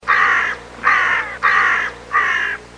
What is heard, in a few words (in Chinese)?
乌鸦; 汽车音效